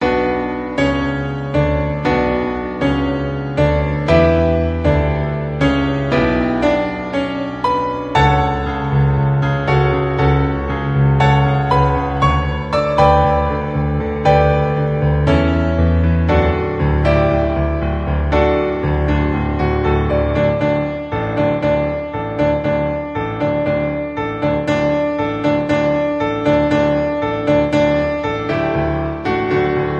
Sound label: Piano Cover